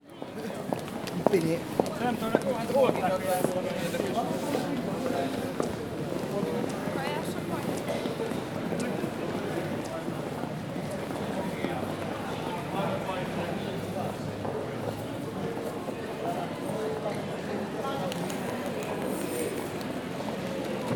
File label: public places airport london uk ambience entrance hall; luton